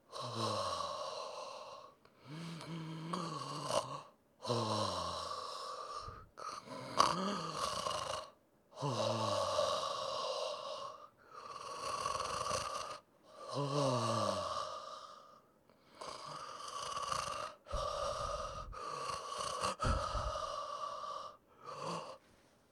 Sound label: sleep loop male